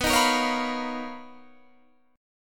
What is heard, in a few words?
B Major Sharp 11th; strummed